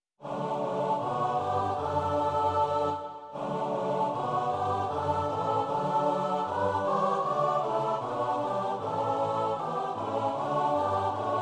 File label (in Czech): pěvecký sbor; Z milosti tak hojné; Imaginativní verze